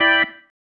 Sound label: Stab One Shots, Organ